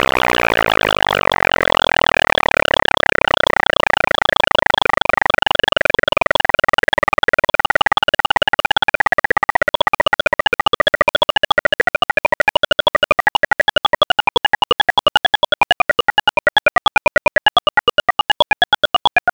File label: fast 2 slow, DNA music